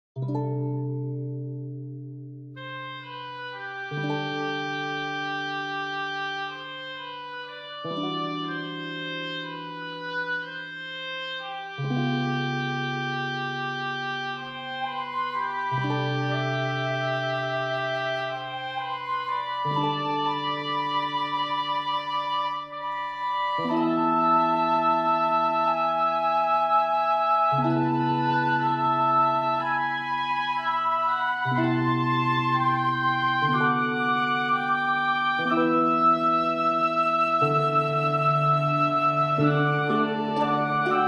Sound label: harp